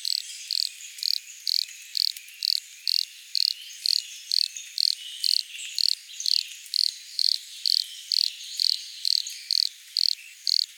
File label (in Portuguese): Brachycephalus sp. canto anuncio